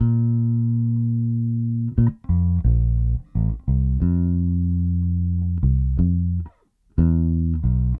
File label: Bass, 70s Analog Funk